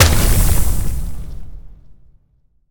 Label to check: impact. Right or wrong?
Right